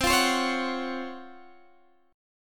Db Major 11th C

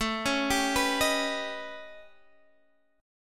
A 9th Flat 5th, Guitar Open D; chord

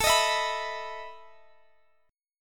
E Major 7th Suspended 4th, A

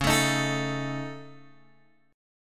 C sharp Suspended 2nd Sharp 5th, Guitar, DADGAD; 11,12,13,14,12,x

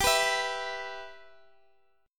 G 6th, Guitar, Standard; G6 strummed

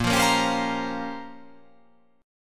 A sharp Minor 6th Add 9th A sharp down, chord

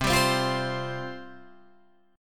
C Suspended 4th C, Guitar Open G

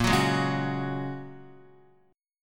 Bb Minor 7th Sharp 5th, Guitar; Bbm7#5 strummed

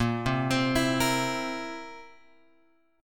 A sharp Suspended 2nd Flat 5th A sharp; A#sus2b5 Chord {6 3 X 5 5 6} arpeggiated

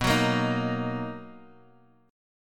B Major 7th, Guitar Open G chord

